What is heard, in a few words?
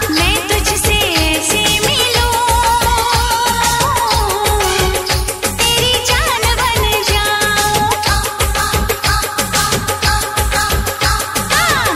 Song Ringtone